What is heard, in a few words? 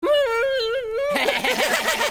Mmmmm hehehe; South Park; Kenny